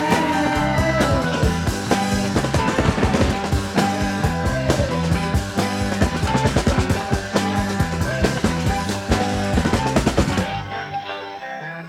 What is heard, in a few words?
rock, surf rock with organ and claps; suno